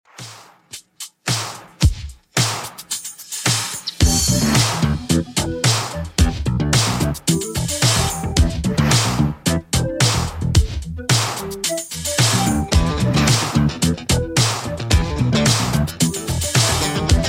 80s Redrum 110bpm

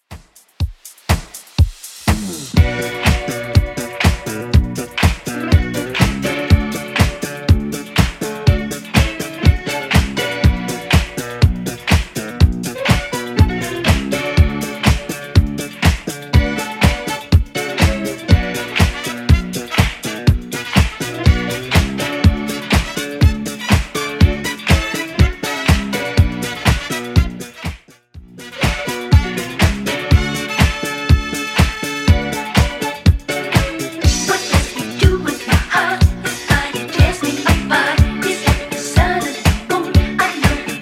70s Redrum 122bpm

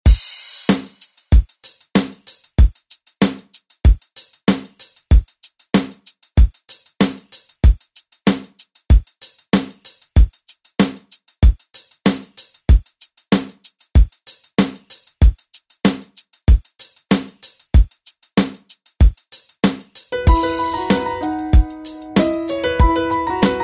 Re Drum; DJ Mhark

